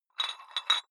dumbbell put on rattling weight hit, fitnessstudio